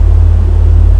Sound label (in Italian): Vento, natura